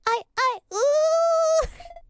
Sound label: uuuuuuu; noises